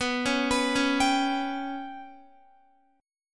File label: B Suspended 2nd Sharp 5th down Guitar; Bsus2#5 arpeggiated; JGuitar